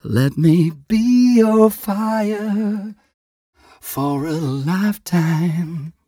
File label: m 129 mel e, Vocals